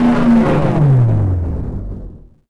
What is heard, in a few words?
overcharge end, weapons